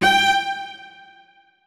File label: notes, keyboard; Hideout Furniture